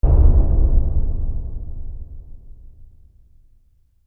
Bass Impact Low Drum; impacts

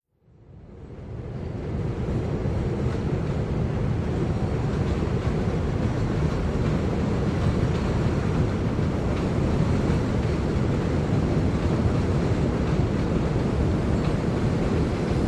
Elevated Train Under, bys

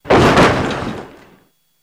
Jeep Chassis Hit, hits & rattles

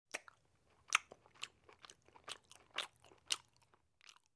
Gum Chewing With Big Lip Smacks Kisses; Quick

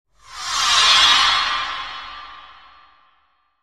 Music Eerie Twkl Pad

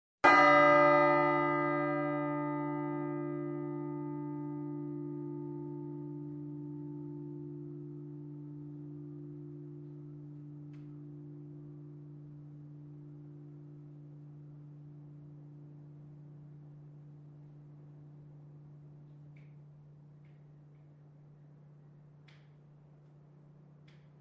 Bell Tower Single Low

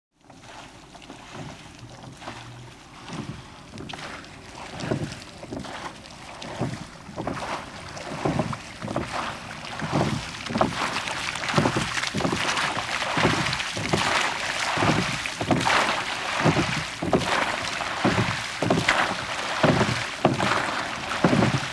Boat Rowing Constant, rowboat & canoe

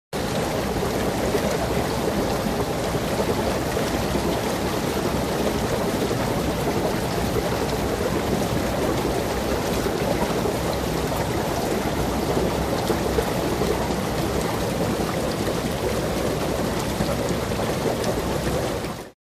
Jacuzzi Bubbles Clos, bubbles

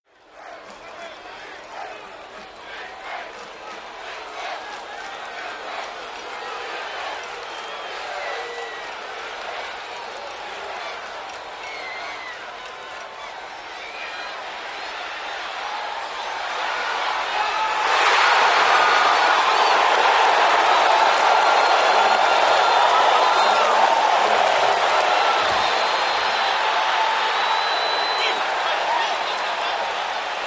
Football Crowd Defe, sports arena football

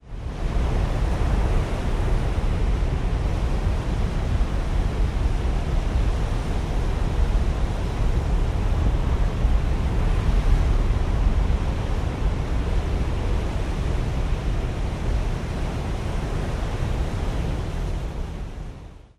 hurricane, rainfall

